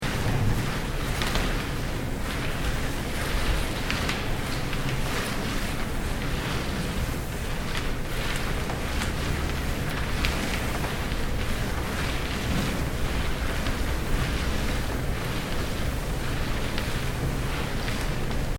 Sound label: cord loud